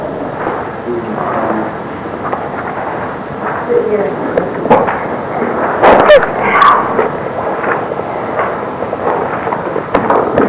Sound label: ball hit, bang scream; evp